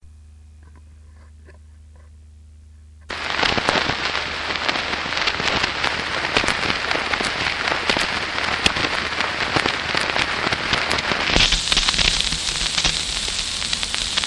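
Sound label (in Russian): шум и помехи очень старой пластинки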